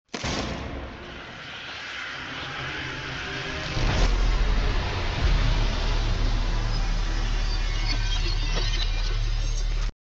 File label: failed sound; doors crucifix